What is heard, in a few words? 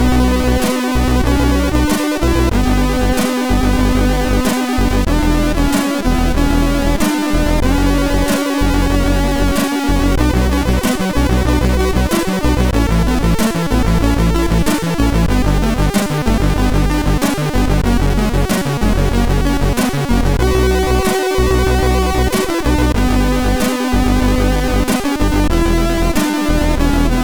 intro, sid MOS6581R2; Northern Lights